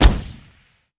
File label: Gun Shot Single Silenced